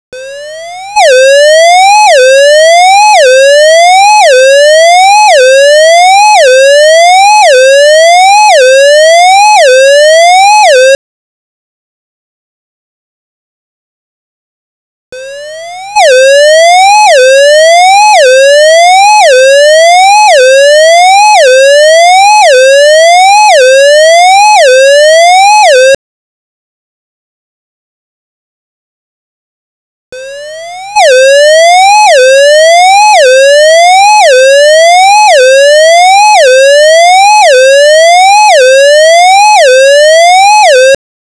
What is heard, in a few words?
siren